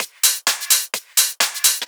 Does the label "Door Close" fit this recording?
No